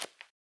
jump concrete; The Swapper

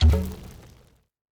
plop; CrossCode; battle; tim

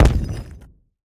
rock burst; CrossCode; battle

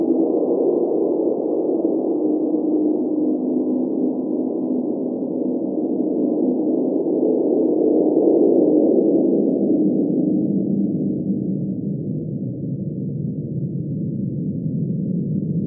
ambience cave; The Swapper

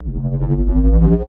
wave charge; CrossCode; battle

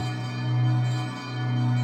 ball gong loop

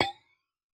dark crystal step

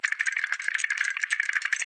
sandshark weak; battle

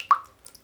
drip, water; World Of Goo

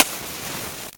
ignite; ZBomb

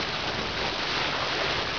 ship; Caesar 2; DOS

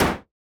meta hit normal; CrossCode; battle

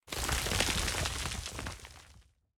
rock debris; CrossCode; battle

